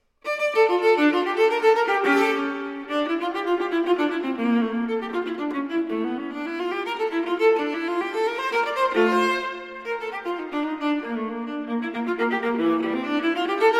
Cello Suite No. 2 in D Minor, for Viola, Courante; J.S. Bach Cello Suite No. 2 in D Minor, BWV 1008 (Transcr. for Viola) III. Courante

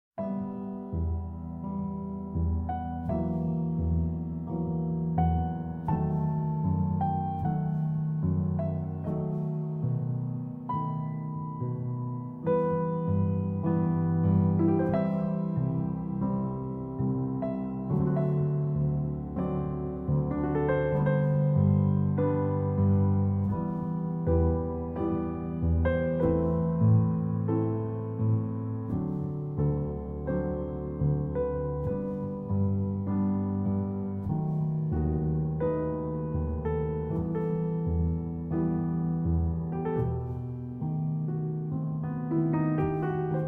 Solo Piano

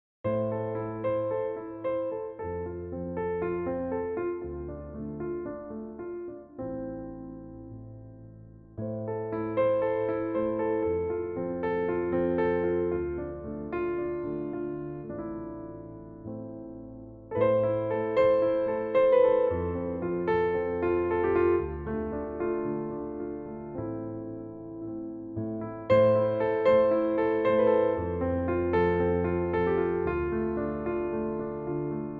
Piano Instrumental, Peaceful Vibrations

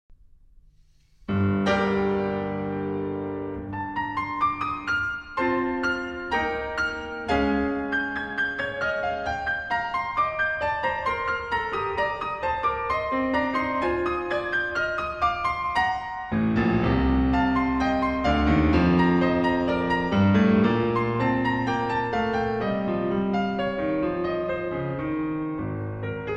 Piano Quintet Prelude. Lento; Op. 57; Poco più mosso - Lento

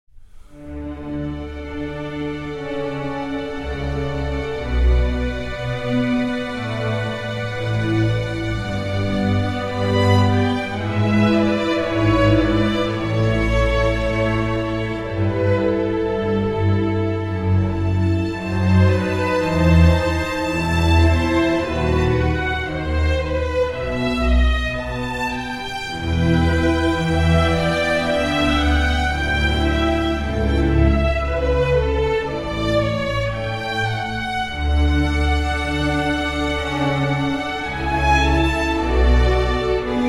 Orchestral Suite in D Major, Air; Bach, JS; Orchestral Suite No. 3; BWV 1068

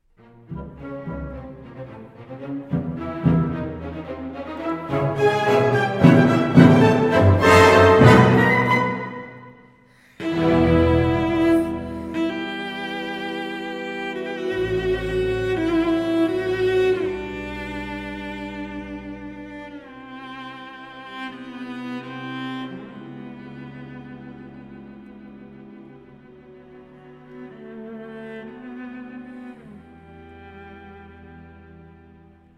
Cello Concerto in E Minor, Op. 85 - IV. Allegr; Elgar

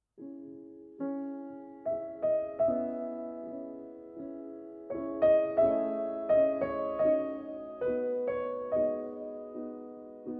Vocalise for Piano

